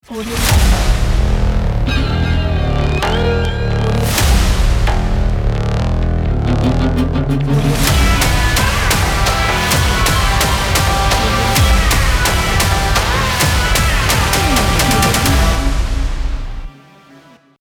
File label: tech trailer logo